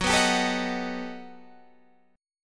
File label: G Augmented Major 9th